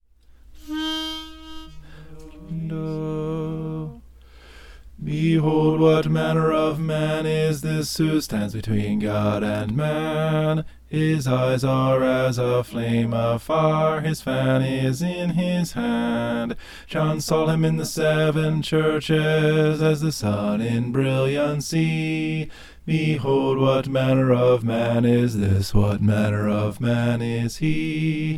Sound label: behold what manner bass